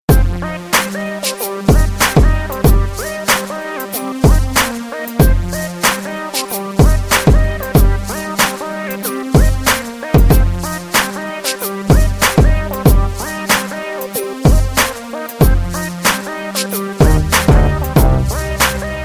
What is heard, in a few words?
Clublife sample, hiphop